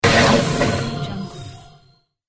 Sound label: electric game smash